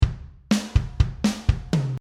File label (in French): Ostinato, batteur